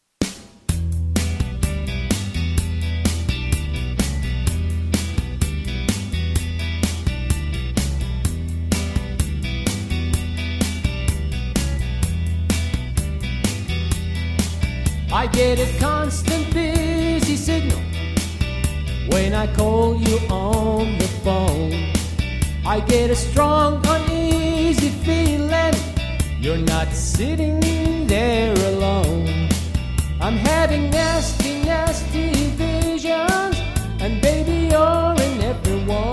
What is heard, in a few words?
vocals